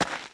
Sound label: gravel, footsteps; hl2